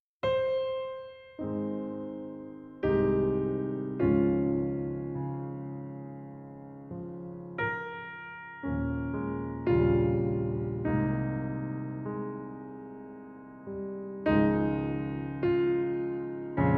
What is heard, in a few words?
Nocturne A-flat major